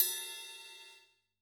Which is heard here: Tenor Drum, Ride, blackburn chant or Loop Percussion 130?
Ride